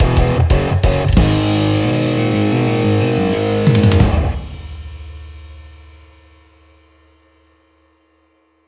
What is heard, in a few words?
Victory Jingle